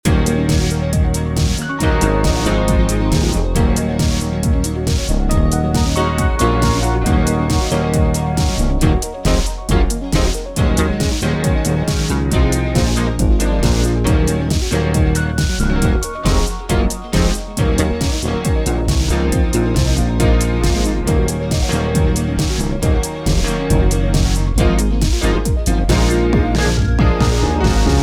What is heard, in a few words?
90s Racing Game Music